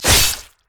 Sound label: basic attack sword; rengar